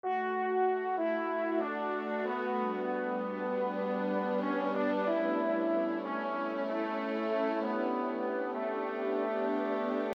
Transition mellow

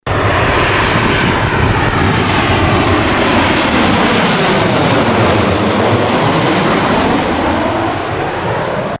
Aeroplane

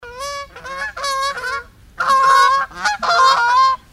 Goose

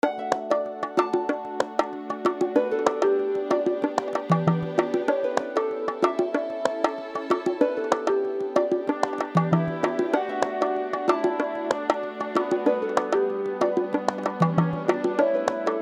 Soft pad

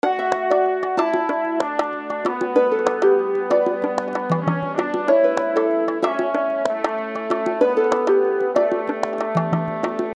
transition soft

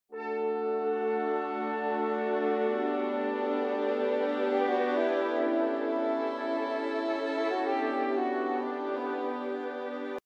mono bg music

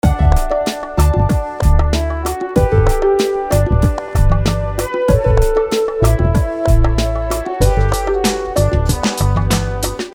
Transition

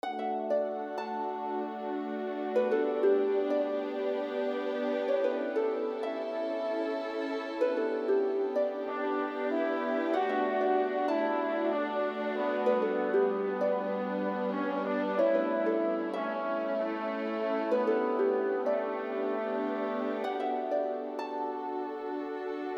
mellow with harp

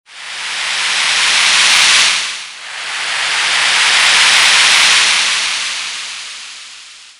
Wave

